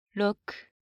骰子

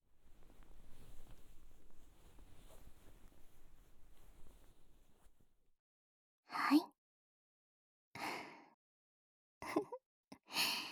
清洁右耳&舔耳; 让我来侍奉您的耳朵

